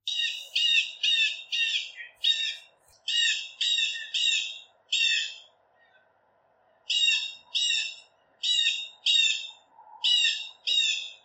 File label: Blue Jay